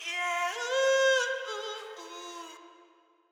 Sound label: Vox; TRUTH-HZ; Crowdsourced Sample Pack